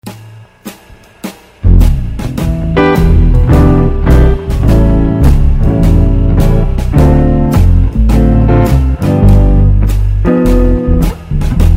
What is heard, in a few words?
jazz